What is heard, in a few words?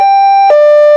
hilo, sirens; whelen 295hsfa6